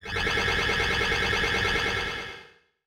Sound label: Science Fiction Sci-Fi Electronic Space Ship Rapid Fire Lasers